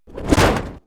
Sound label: Metal Impact, perc fx; X-Static Goldmine